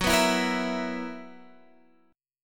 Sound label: B Major 7th Suspended 4th F sharp